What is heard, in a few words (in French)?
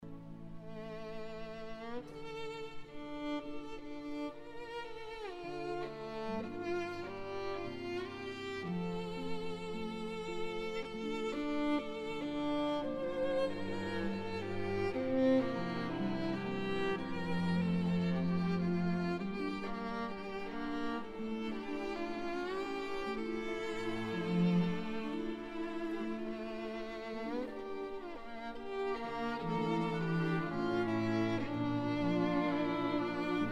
violon